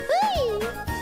uiiii

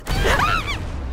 Scream; IRyScream

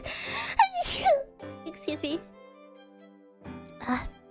Sneeze

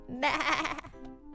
sheep

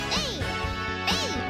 ey ey

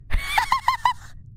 sudden wheeze

